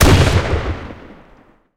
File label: cannon 30mm mk103 38 shot